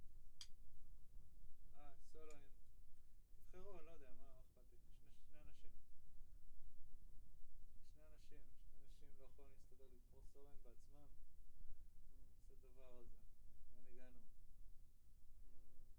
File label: Kit